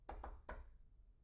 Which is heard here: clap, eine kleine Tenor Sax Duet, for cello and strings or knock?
knock